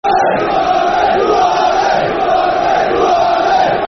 Blue Army, Football Chant; Soccer Songs; fanchants; Huddersfield Town Htfc

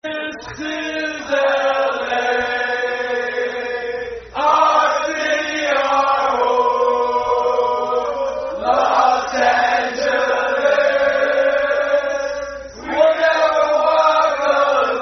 Football Chant (Soccer Song); This Is L.a.; Los Angeles Galaxy Lafc

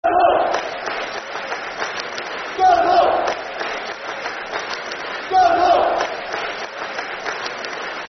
Clap, Football Chant, Soccer Songs; Scunthorpe (Clap); Scunthorpe United Scfc

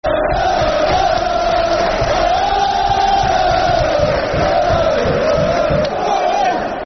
Football Chant; Soccer Songs; fanchants; Huddersfield Town Htfc

